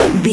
retro impact hit

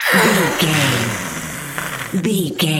ghost witch voice hiss

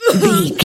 voice female hurt pain choke

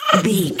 goblin fairy effort push fast

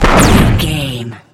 energy blast large

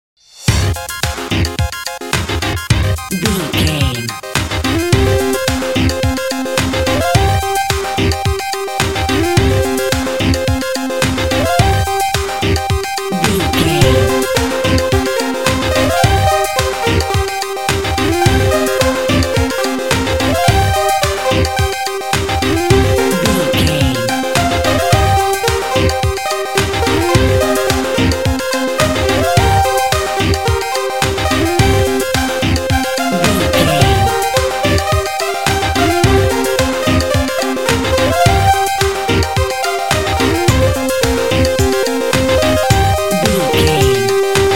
music 8bit jammer

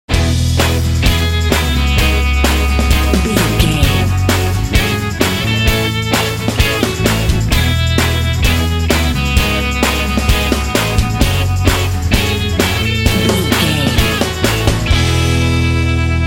indie geeks sting

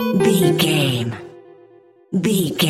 jingle chime negative

